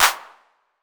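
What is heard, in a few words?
808 clap; PERC TR; Wizoo - Platinum 24 Electronic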